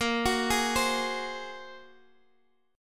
A sharp 7th Suspended 2nd Sharp 5th A sharp, Guitar Standard; arpeggiated; x,x,8,11,9,8; chord; JGuitar